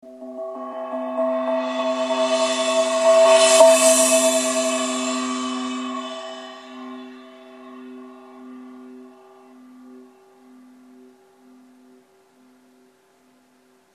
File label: Feng 25